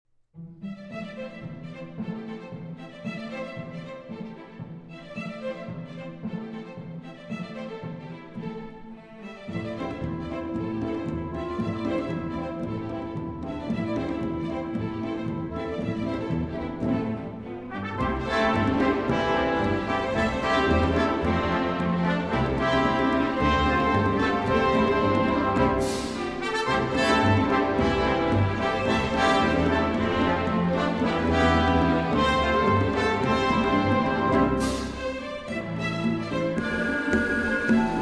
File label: Symphony in E Minor, Juba Dance. Allegro; Price